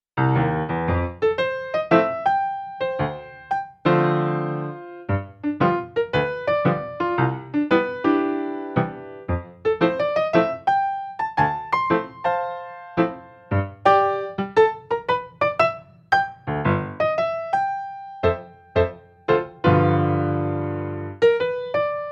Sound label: Dexibell Vivo SX7 Vivo Upright